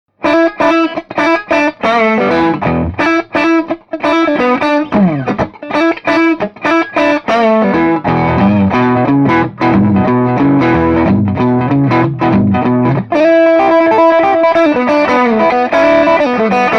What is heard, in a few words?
overdrive